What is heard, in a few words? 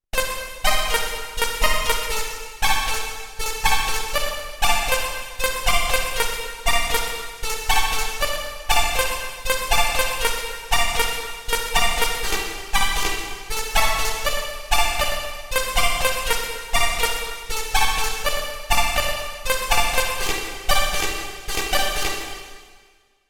OP-1 DNA